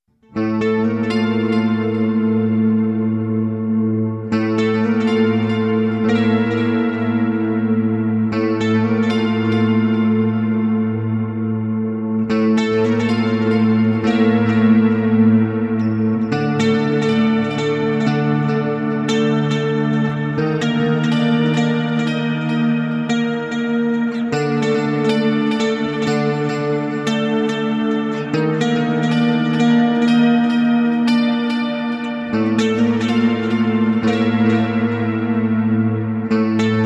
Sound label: old frame guit